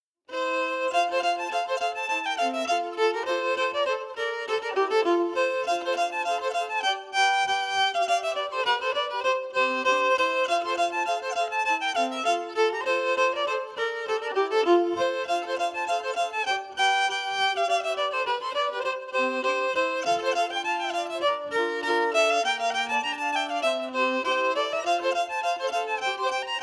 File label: melody emphasized; Hulls Victory